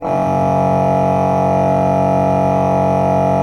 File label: F1, Cathedral Organ, HOLZPRINZIP; Propeller Island